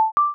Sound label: notification